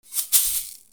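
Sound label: percussion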